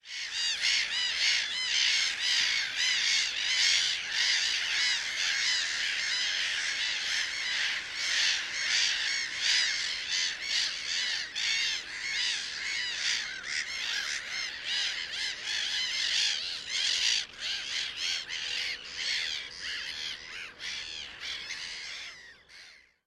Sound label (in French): mouette rieuse; chant